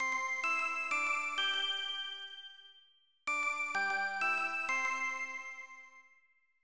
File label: School Chime; snes; Heracles no Eikou IV Kamigami kara no Okurimono